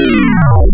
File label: Digi Metal, wavetables; Animoog